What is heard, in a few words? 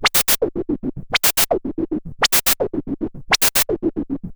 MiniBrute 110BPM, Gated Seq Noise